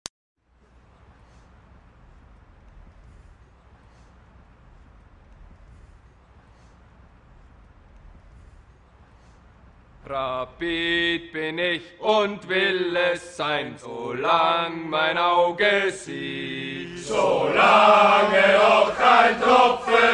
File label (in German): die alte rapid hymne